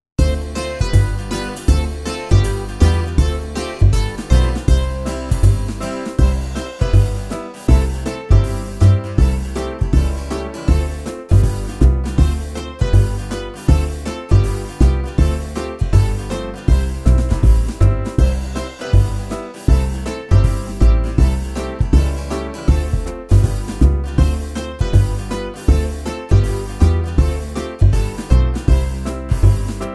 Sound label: Karaoke